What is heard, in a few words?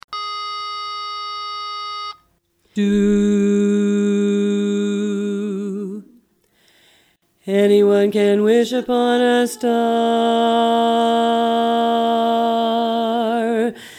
Bass